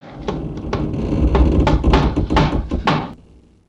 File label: Creaky Bridge